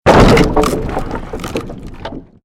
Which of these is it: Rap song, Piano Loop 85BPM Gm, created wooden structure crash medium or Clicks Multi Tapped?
created wooden structure crash medium